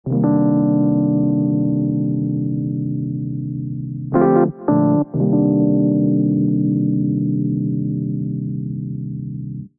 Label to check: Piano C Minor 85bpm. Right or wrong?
Right